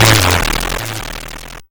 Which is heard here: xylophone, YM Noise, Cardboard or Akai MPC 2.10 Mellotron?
YM Noise